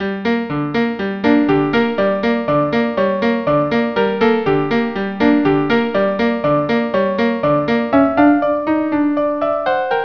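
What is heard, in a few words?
violin viola duo; Khosn Kale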